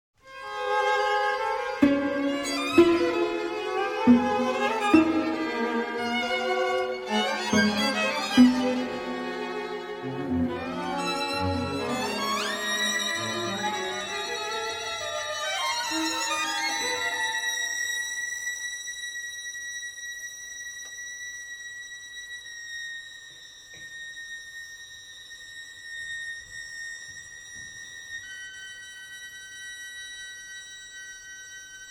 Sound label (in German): streichquartett